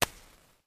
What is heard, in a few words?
Match Strike